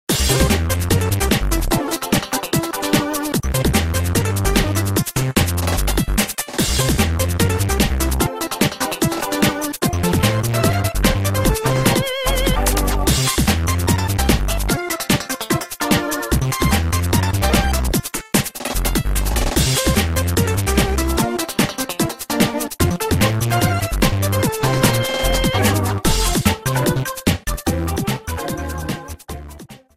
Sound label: Music Battle Mode; MKDS